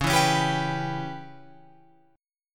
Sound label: C sharp Minor 7th Flat 5th C sharp Guitar Open F; C#m7b5 strummed; 13,11,13,14,14,14; JGuitar